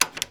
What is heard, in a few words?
Switch